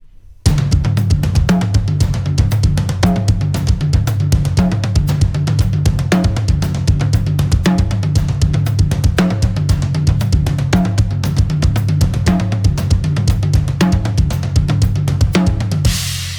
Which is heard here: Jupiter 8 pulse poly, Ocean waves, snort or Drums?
Drums